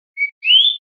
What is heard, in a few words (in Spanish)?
primaveras, verderon